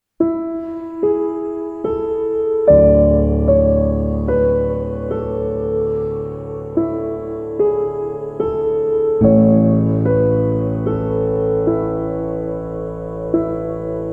Piano, Interlude; Peaceful Piano Music

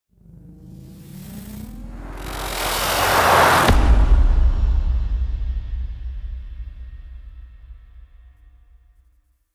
Granular Impact Smash; Gothic Storm Music; Brain Warp (Sound Design Trailer Tools)

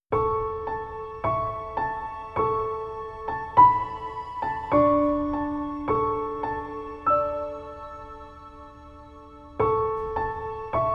Piano, Baby Lullaby Piano Sleep Music

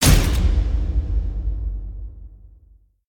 Hit FX Lock Down; Brand X Music; Brand X Music Catalogue - Editor's Essentials

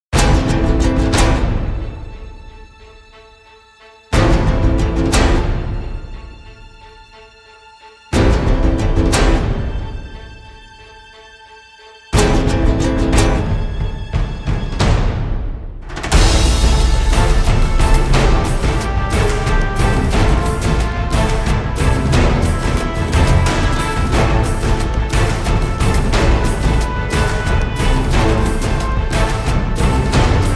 No Vocals, Epic Action & Adventure

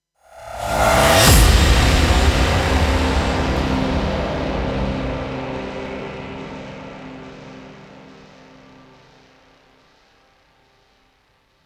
Nuke; Gothic Storm Music; Brain Warp (Sound Design Trailer Tools) 2011

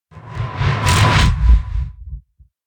Vertigo Wipe Wipe; Ninja Tracks

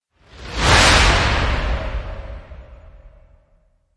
Dire Hit Swell; Ninja Tracks

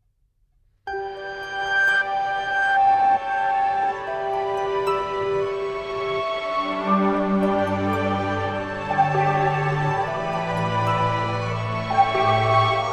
Ambient

